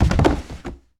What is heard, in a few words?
Bodyfall Wood; SFX Kit; Bodyfalls